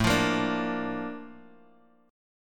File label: A sharp Major 7th A